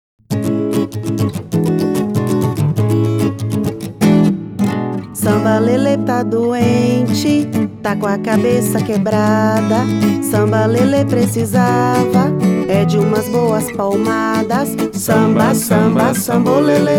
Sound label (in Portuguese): samba lele